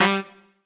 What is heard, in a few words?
Trumpet melo